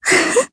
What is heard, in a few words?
Laugh; Jane; jp; kingsraid